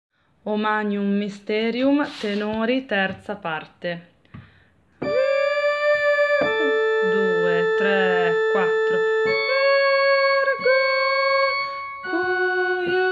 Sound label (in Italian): O Magnum Mysterium tenori